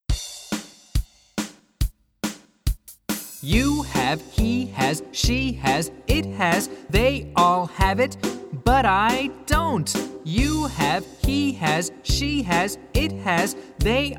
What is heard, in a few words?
chants